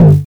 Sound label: TOM, TRASH KIT; USB Soundscan vol.11; Drums Dance & Groove; AKAI